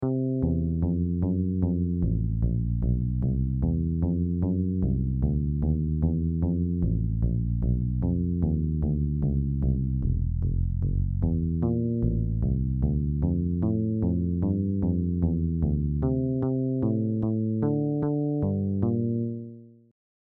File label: Jazz Blues In C For Bass; Bass Exercises